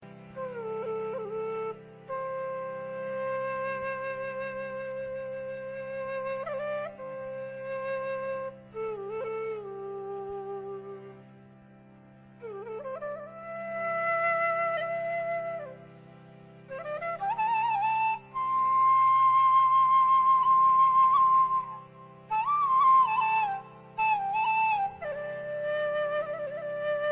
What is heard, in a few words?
Bansuri Flute Ringtone